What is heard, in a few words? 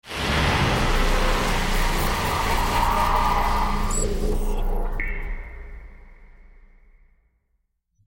evil glitch